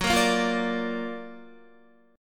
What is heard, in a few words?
Gb Augmented Major 7th Gb down Guitar 4F,4A,5C,5G,5C,6E; Gb+M7 strummed; JGuitar